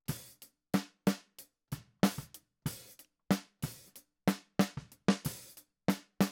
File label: Snare